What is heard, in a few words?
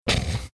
snorting quietly